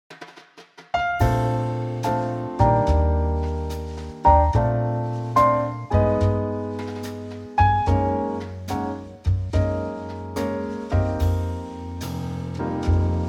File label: Bb Trio, male; key - Bb - vocal guide - Bb to D